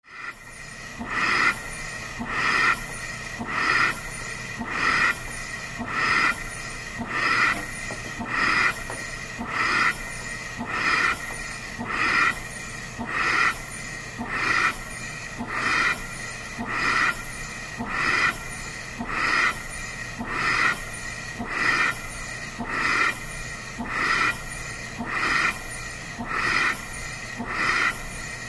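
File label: Respirator Breathing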